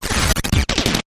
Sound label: Hard Sputter